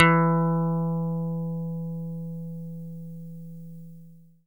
E-GITARRE, Alpine Volksmusik; Best Service

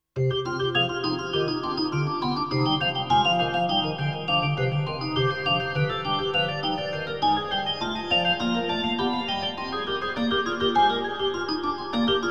B3 Organ; Masterbits CLIMAX 8

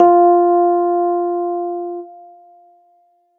roads, leads; Polyend; ARC Noise

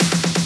Fill, 128BPM; Multi Drum Loop 13

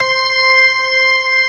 B3 ORGAN; E-MU Formula 4000 Series Vol. 2

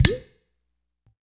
UDU, Supreme Beats - African Contem; Spectrasonics; SB Udus

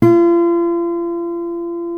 F#4 MARTIN 6, Guitars; Spectrasonics; Hans Zimmer Guitars VOL-1

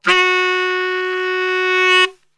F#2 SAX, Brass Super Section; Best Service

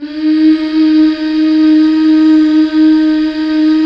MMHH D, Back.Mmh Fem

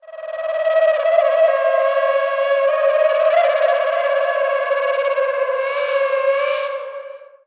PROCESSED OCARENA, LIVE ACOUSTIC PERCUSSION; Sonic Foundry (Sony Creative Software); Vir Unis Infusion

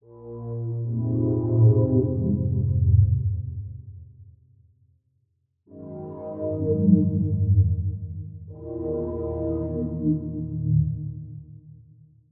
reason syn; Synths and FX; neuro; Sinistarr

